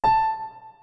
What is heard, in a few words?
piano note